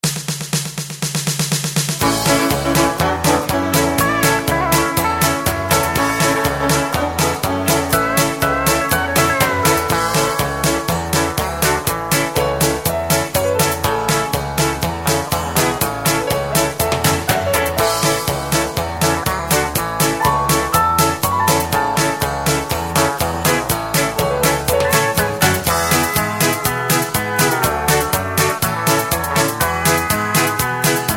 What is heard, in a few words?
music